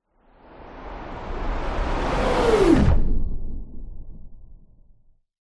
science fiction pass by whoosh fast into another dimension; zapsplat